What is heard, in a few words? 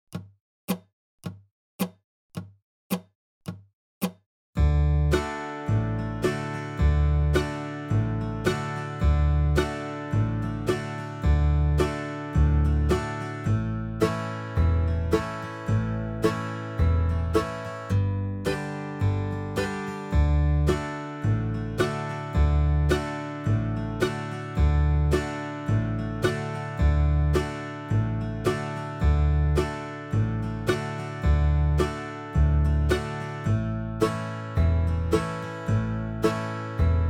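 Key of D 54 BPM, Dobro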